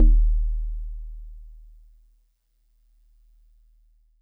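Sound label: Celeste DX100 D#1; Pristine Celeste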